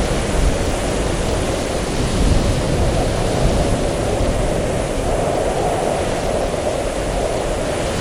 ambience snow storm; everness

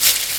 leaves footstep; everness

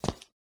ceramic footstep; everness

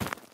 stone footstep; everness

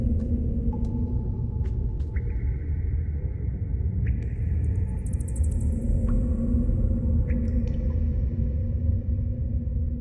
ambience cave; everness

